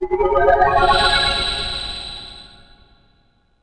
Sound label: item full; Skies of Arcadia; Menu Sounds; Use item full